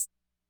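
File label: closed hi-hat, classic machines; arranger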